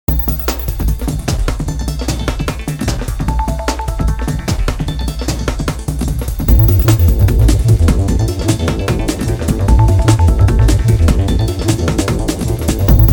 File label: Avant Garde Dance